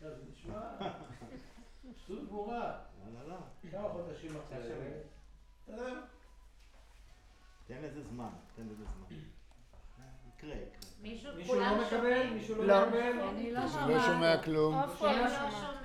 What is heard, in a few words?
Choir